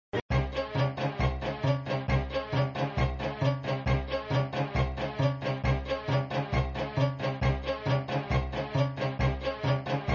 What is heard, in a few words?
Music Continue and Superball chose music looping, flipper